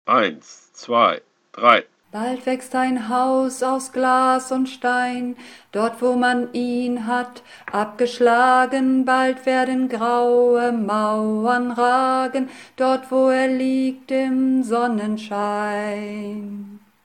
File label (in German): Bass